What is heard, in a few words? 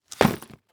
Household Bag Set Down Duffle